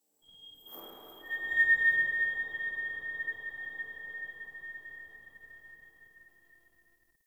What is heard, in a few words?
Hub Texture N, Ambience, Dungeons, Under City; Silent Rose